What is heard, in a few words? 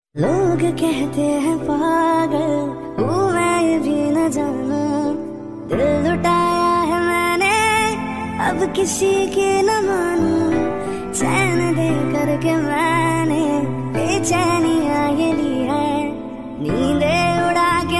sad music ringtone, bollywood; bollywood ringtones